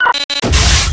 scanner siren; scmod

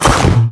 assault gun fire 20mm; ragemap2025; fournines

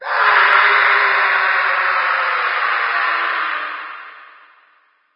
scream

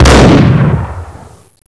shot

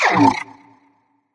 pain; scmod

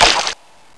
slash; flyclaw

